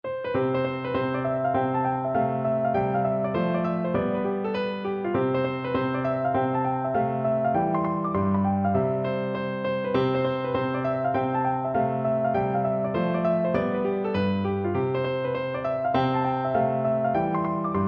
red haired boy piano

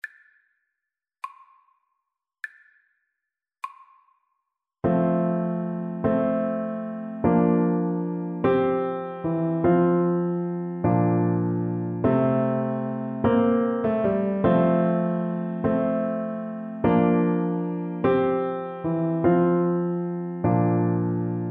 skye boat, Tuba

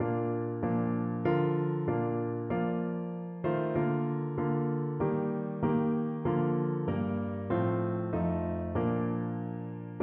angels from the realms, piano

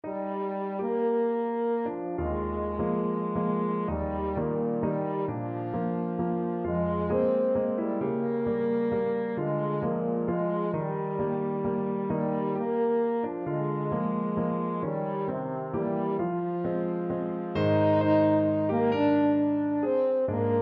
emperor waltz, french horn

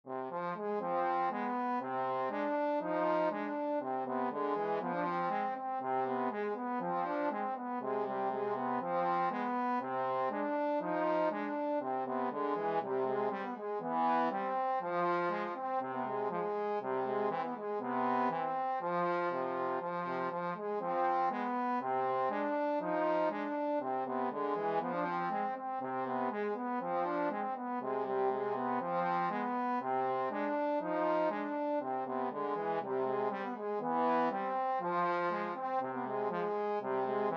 trombone duet

